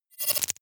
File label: appear splutter